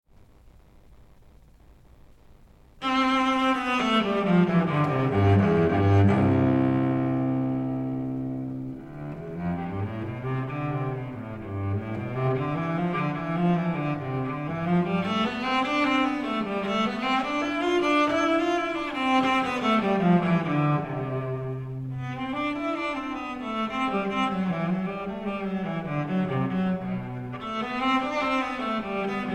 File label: Suite for Solo Cello No3 in C Major I Prelude LIVE, Cello; JS BACH